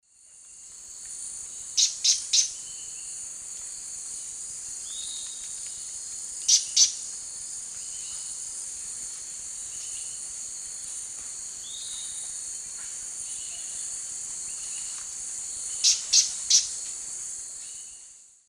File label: Glyphorynchus spirurus, call; S. America; Eastern Lowlands